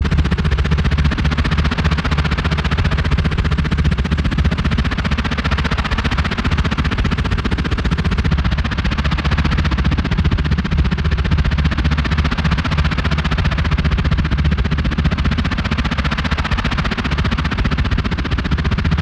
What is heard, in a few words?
Rotor Engine Designed Futuristic Scifi, Scifi Passby; designer Series; PMSFX